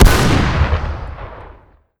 revolver shoot; weapons; tf2

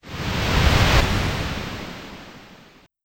spy uncloak and dagger; tf2

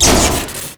welder fire crit; tf2

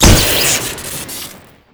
acidlauncher shoot crit; tf2

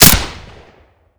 mp40 bleed shoot; tf2

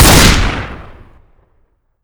shotgun shoot; tf2

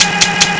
snail gun empty; tf2

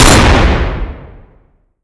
flintlock shoot; tf2

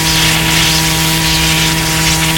circle saw fire crit, weapons; tf2

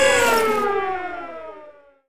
aa gun wind down, weapons; tf2

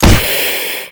acidlauncher shoot; tf2

